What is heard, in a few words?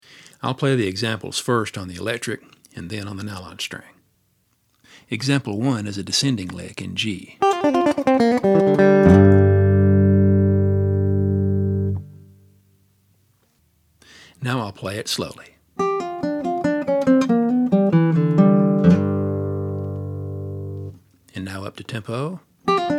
hot tele licks